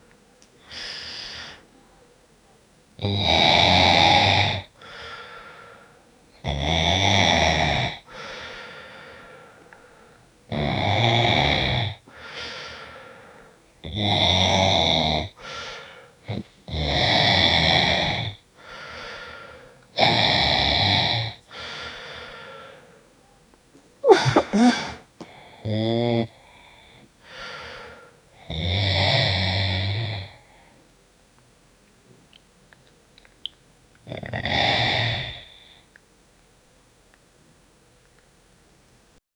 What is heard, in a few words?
18 years old man holding, close mouth